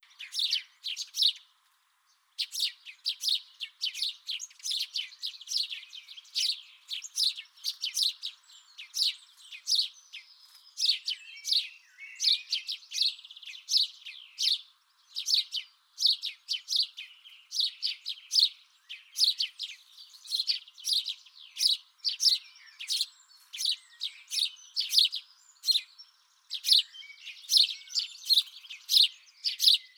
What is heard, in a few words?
Passer domesticus